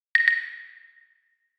Peking Opera Drum, notification; Wiko Hi Enjoy 60s

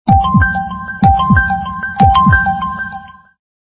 ringtong; Gionee GN205

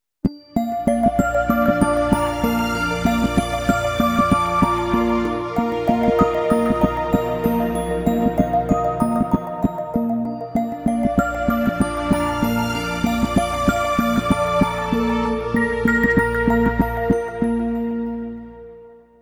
ringtone; Oppo; Find X5

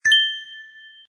Video Record, ui; Doov

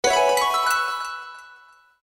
Japanese slide open; Nokia; audio themes

